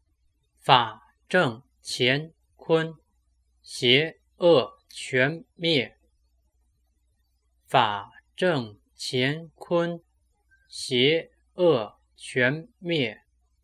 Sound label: mantra